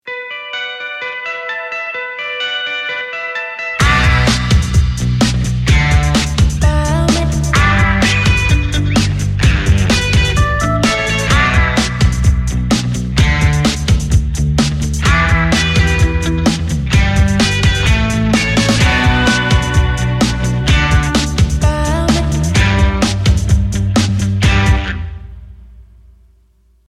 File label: GUITAR; Excellence Creme